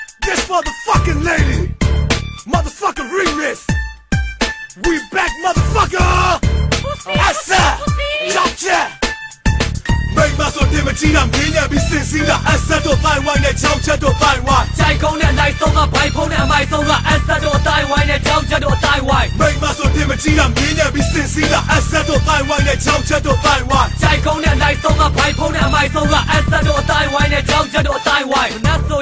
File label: myanmar hip hop song